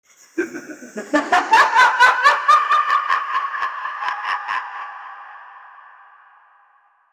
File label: laughing sound